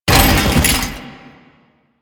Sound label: Bodyfall; Foley